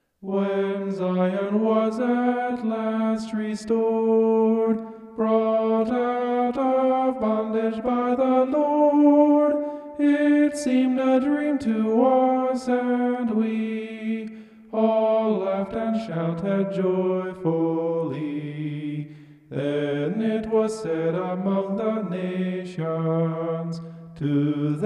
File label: vocal homoph; psalm 126